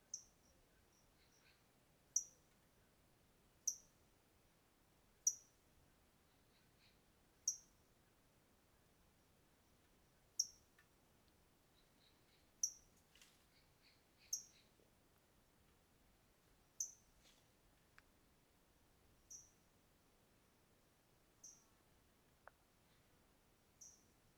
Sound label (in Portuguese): Morcego em fim de tarde com passaro e grilo longe; animais; Coleção Sonora do Cerrado